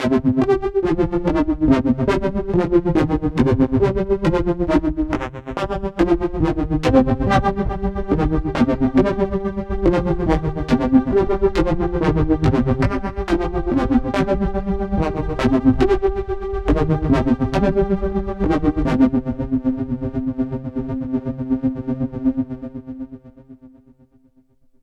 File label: Pads; Free Osiris; KJ Sawka - Drum n Bass & Beyond